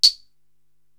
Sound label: Tambo, drum machine; Korg TR-Rack; Donkamatic